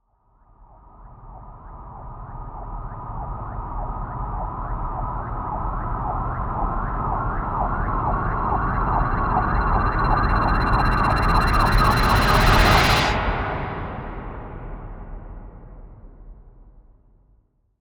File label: Riser